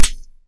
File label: impact flesh